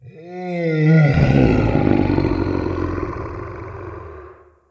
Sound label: roar